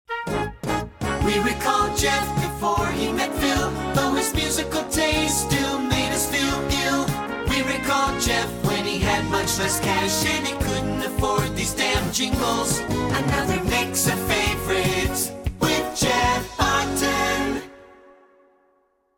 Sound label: Jinglebells